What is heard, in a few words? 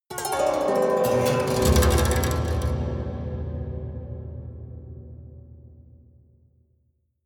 haunted strings down stroke fast hard